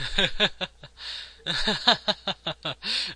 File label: rhythmic laughter, male; VOCALS and SPOKEN WORD